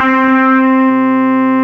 Fuzz Gtr C4, Fairlight CMI IIe, Guitars

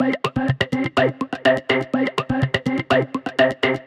124 Cm Trancidic Plucks, Melodic Loops; Electronisounds

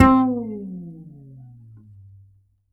Upright Acoustic Bass, 1ST POSITION; Larry Seyer

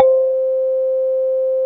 Kalim Lo C5, Mallets

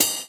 Cymbal, jungle breaks drum sounds

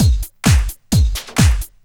drum 130 straight; Techno Trance Essentials

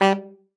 G3, ALTOSAX; Xsample VOL-2

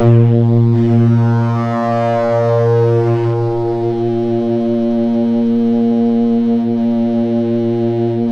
A#, Synclav FM; Big Fish Audio; SPACE2

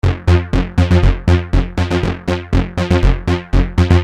Syn-Bass m F, Techno Synth Loops, 120bpm; Sonic Foundry (Sony Creative Software); Signals I

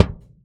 Punch, Kicks; Airwindows

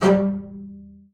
G3, bass spicc; Xsample VOL-5

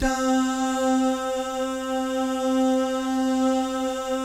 DAH, Vocal Jazz; ILIO

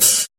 HH, HIHAT HIP, Dance Mega Drums

